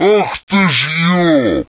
death

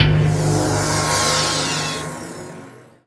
magic

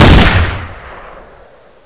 FA18 FIRE

